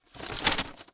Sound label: menu in